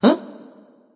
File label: surprise; smite; voicepack; medusa